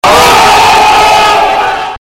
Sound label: distortion; supahot